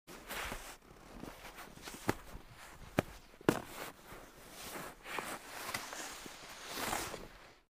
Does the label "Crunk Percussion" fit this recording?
No